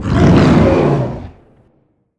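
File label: human big male die, voice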